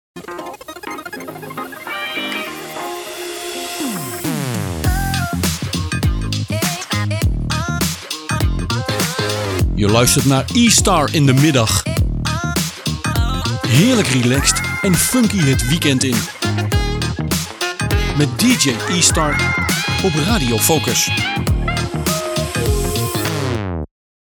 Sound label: Jingle; E-Star in de Middag